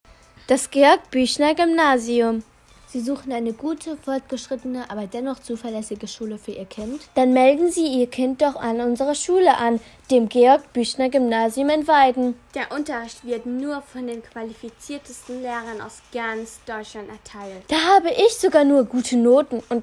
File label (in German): Musik; GBG Werbespots